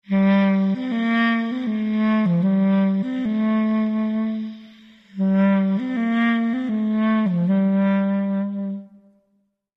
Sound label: Dialog; the walking dead season one gamerip 2012; Linear Dialog 02b